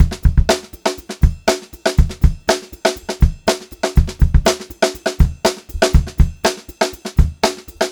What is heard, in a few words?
121 funky, Funk-Groove Drumloops; USB Soundscan vol.38; AKAI; 90 s Sample CDs